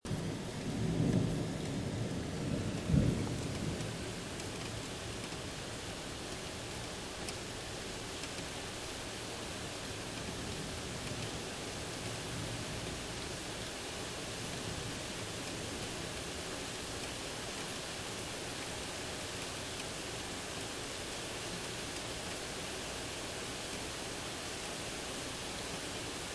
the sounds of a thunderstorm in northern Manhattan